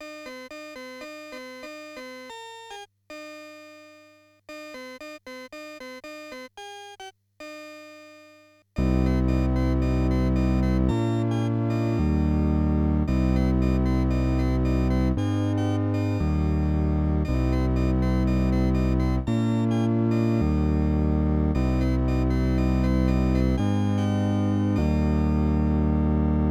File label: Casio Casiotone MT-800; Musical Showcase